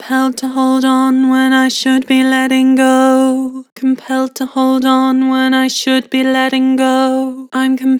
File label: Vibrato, Vocals; Compelled to hold on; Harmor Vocals